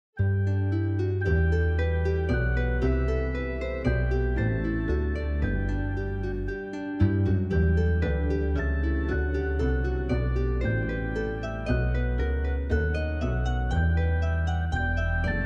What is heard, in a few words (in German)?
Nun lasst uns gehen instrumental